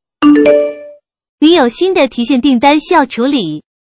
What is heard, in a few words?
withdraw